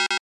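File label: System Reject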